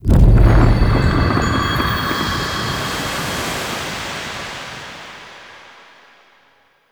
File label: Respawn Stone Activate; Ambience; Props; Warframe; Duviri